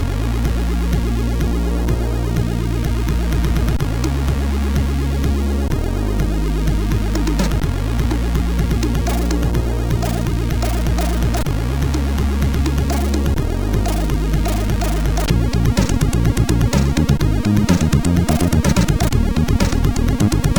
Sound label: Shortwave, MOS6581R4